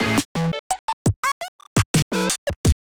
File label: stem loop